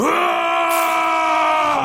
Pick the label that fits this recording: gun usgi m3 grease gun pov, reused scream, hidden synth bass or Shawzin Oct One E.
reused scream